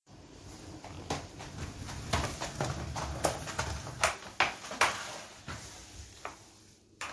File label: Mopping